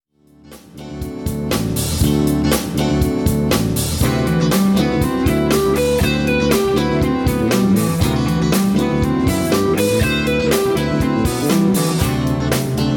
Fretboard Navigations, Guitar Techniques; Guitar Techniques 2019 Issue 290 January

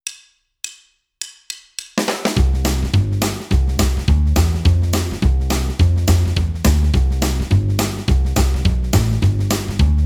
Rhythm Guitar

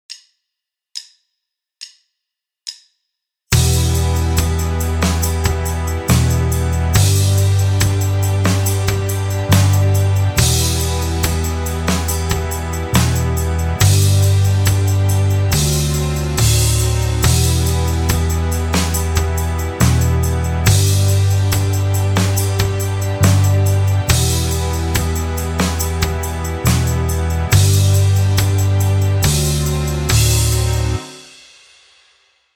backing; Guitar Techniques 2018 Issue 288 November

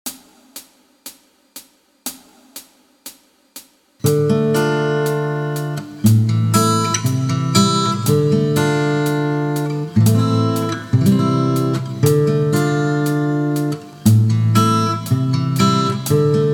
Lickbag, Guitar; Guitar Techniques 2019 Issue 297 July

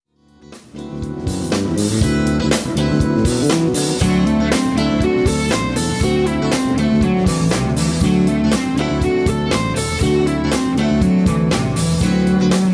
Fretboard Navigations, Guitar Techniques; Guitar Techniques 2019 Issue 290 January

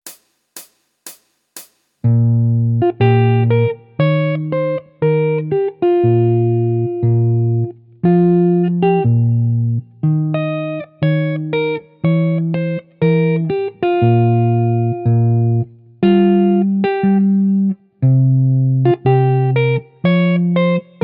Jazz Chord Melody, Guitar

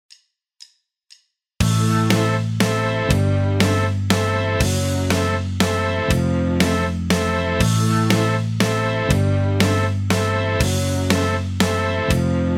backing, guitar; Rockschool; Guitar Techniques 2018 Issue 289 December

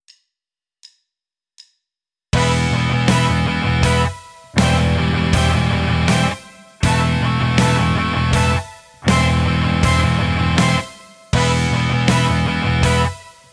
Rockschool, Guitar; Guitar Techniques 2018 Issue 289 December

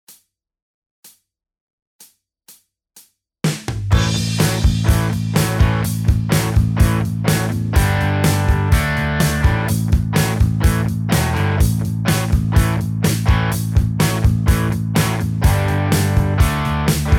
Blues backing, guitar; Guitar Techniques 2018 Issue 289 December

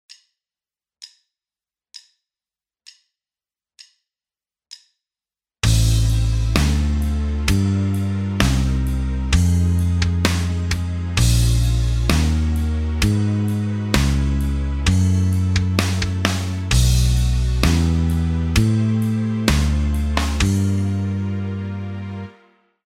In The Woodshed backing, Guitar Techniques; Guitar Techniques 2017 Issue 275 November

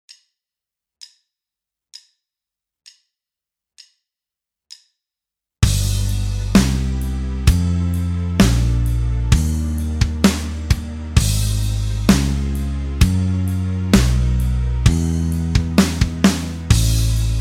backing; In The Woodshed; Guitar Techniques 2017 Issue 274 October

